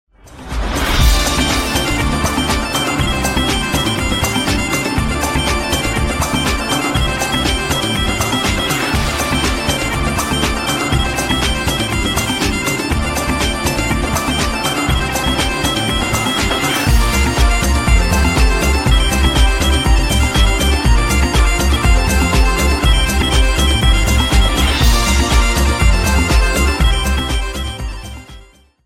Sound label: iphone 5 irish ringtone